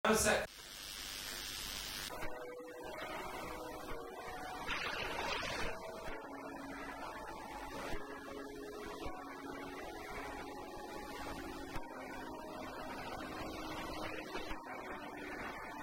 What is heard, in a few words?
Piano sketch